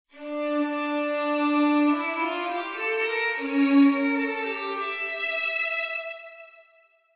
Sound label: short violin